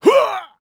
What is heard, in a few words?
throw